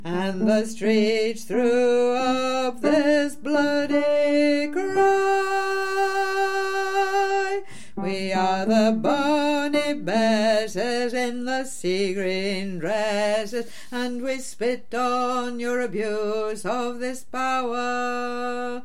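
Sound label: chorus tenor low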